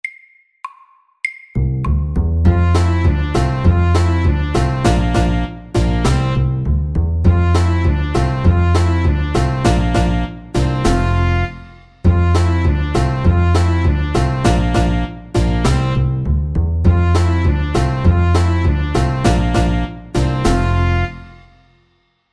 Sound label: Line dance MEDIUM 100